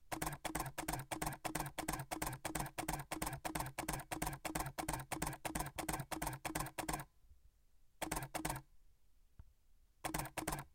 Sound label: Canon EOS 5Ds Silent Burst